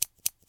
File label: mechanical pencil, シャープペン; シャープペン２